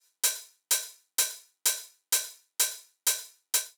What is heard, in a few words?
127 Swing Hats